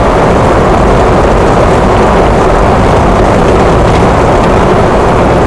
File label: skid grass, Vehicle; NASCAR Thunder 2004